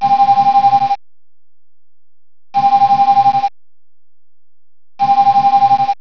yellow alert; xshipwars

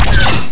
fire pulse; xshipwars

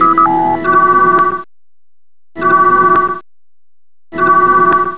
auto destruct, beeps; xshipwars

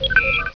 set channel

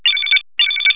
antimatter low, beeps; xshipwars

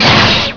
fire projectile, weapons; xshipwars

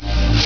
shield down; xshipwars

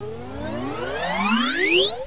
power up, engine; xshipwars

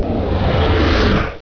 tractor beam disengage

